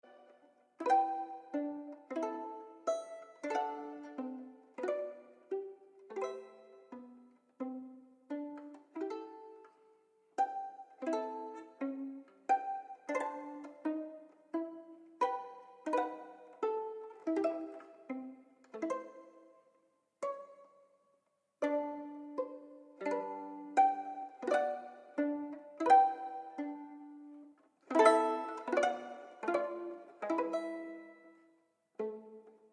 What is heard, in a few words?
Violin Sonata in A Minor, Sarabande; Violin Sonata No. 2 in A Minor, Op. 27 No. 2; III. Danse des ombres